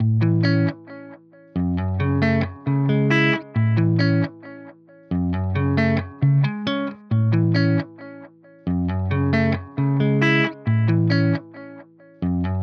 135 BPM C# Min Main Guitar; Cymatics; Miami Guitar Pack - Pt 2; Mania

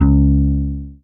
Bass Guitar C#; Basses; Cymatics; Eternity Sample Pack

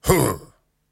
Grunts and Chants, Viking Vocal

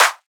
Riddim Clap; One Shots; Ghosthack; Riddim Essentials 2020

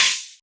Open Hihat; Cymatics; Future Bass Starter Pack; Drums - One Shots; Cymbals

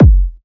Kick, Techno, One-Shots, Drums

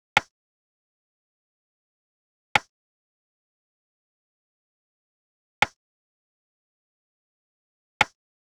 Wood Block Drum Loop - 176 BPM Offset Snare; Cymatics; Mystery Pack Vol 4

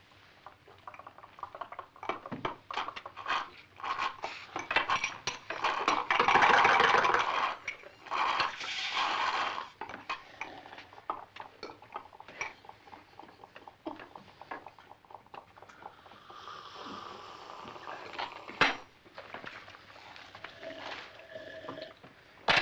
LoFi Group Soda Suck; Vintage Cartoon; Comedy Vocals

